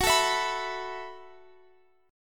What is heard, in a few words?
Gb Minor 11th Gb down Guitar Open F; Gbm11; strummed